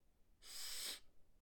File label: Girl Sniffing A Long Dry Sniff